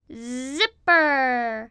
Zipper

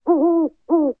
OWL HOOT; The Interactive Alphabet

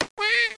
BIRTH

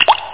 water blubb; Aminet 21; GTI - Schatztruhe; 8,363 sample rate

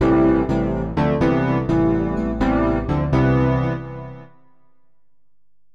SYNTH VOCAL 125 A; Keys

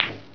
BALL HIT; POOL CHAMP

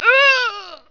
critical stop; uo theme

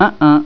"Uh-uh"; PPPop 1.6 PPC

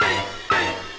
Synt; SYNTH; 120 BPM

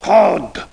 Klingon Default Beep; KLINGON EMPIRE

